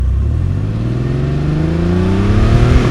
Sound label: Porsche